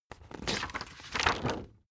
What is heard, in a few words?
spring binder open long